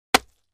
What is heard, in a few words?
Bow, arrow hits the target; cold weapons